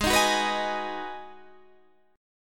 B 6th G sharp, guitar chord

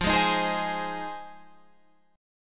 F Minor 7th F Guitar Open F; strummed

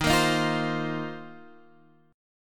Bb Suspended 2nd Suspended 4th, chord

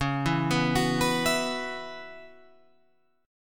C sharp Minor 11th, Guitar Open F; arpeggiated; chord; JGuitar

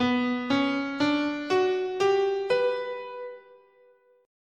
B Hirajoshi

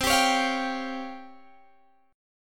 C sharp Major 7th Flat 5th C

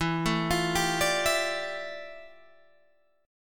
F Major 13th E, guitar chord

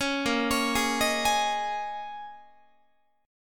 A sharp Minor 11th A sharp down Guitar, chord; A#m11; arpeggiated; 16,18,16,18,19,19

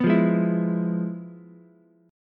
F Minor Major 7th Flat 5th Ukulele; FmM7b5 strummed; JGuitar

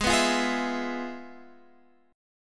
F Minor Major 7th Flat 5th, G sharp

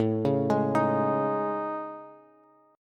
A Major Sharp 11th, Bass, Bass Standard; AM#11; arpeggiated; JGuitar

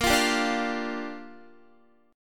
A sharp 6th, Guitar; A#6; strummed; x,13,15,12,14,12

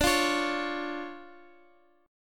D Minor Major 7th D Guitar Half Step Down

